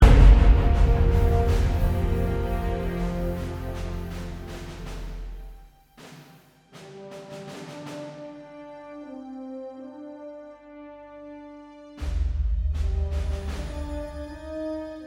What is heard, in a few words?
Global Conversation Triumphant Low